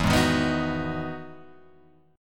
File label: E Major 9th E down Guitar Open G